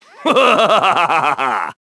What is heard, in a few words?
LAUGH; BattleSNDS